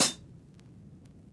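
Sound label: Vinyl Closed Hat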